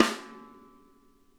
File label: SNARE; Sonic Reality; Interactive Drum Kits